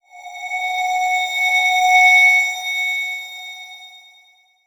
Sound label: Transition Bell Swell; Production Element Title Transition